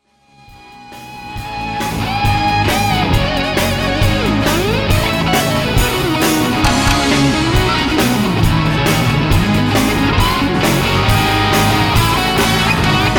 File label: jyonga rhythm